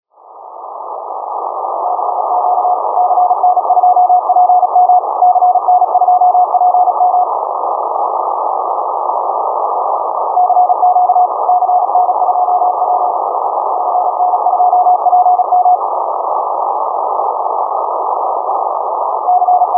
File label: 28.036 MHz CW